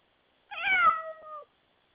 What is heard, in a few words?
cat meow